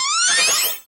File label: thorn spell effect rpg